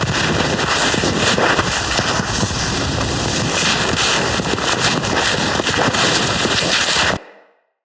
Knee Slide Loop Snow; Tenno

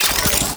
Charge Up; Warframe; Corpus; Spear Gun; BE Ferrox

